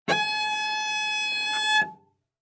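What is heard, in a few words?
Cello arco fortissimo sul A Ab5; MIS Pitches - 2014; Strings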